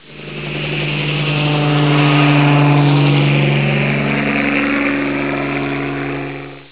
heli

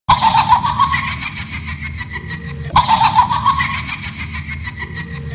muhaha; saw

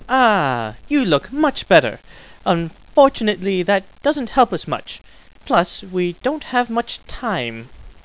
ahh, scientist

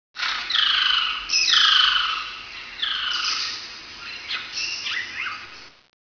ambi; lost world

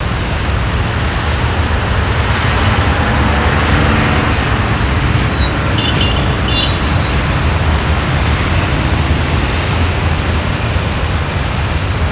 city amb loop, ambience

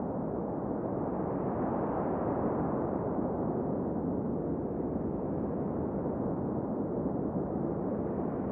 spacious wind

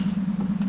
eng back

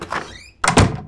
wood close

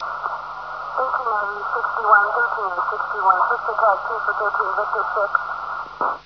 police

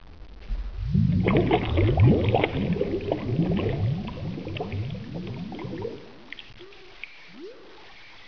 blub; de pandemonium

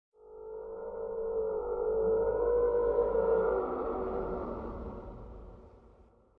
Dino Distant; docks

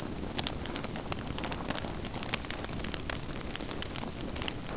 alt fire

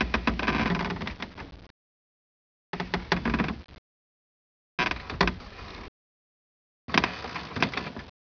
creaky wood; firedamp

